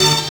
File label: HEIDI CHORD, Synthy Tonal; Distored Reality